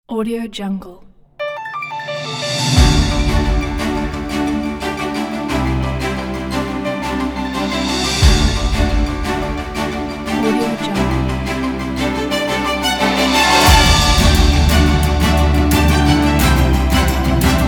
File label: breaking news